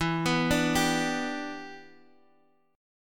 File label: E Minor 7th, down, Guitar, Open G